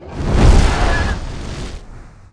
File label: sword